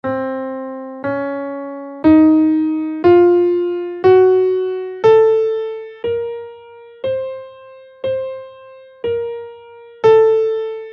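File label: locrian natural 6 in Cm, guitar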